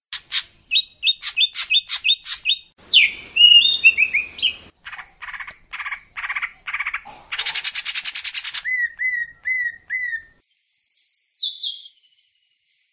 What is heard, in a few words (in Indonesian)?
kombinasi iji anis kacer